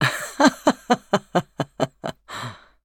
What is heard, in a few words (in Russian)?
Смех; Симметра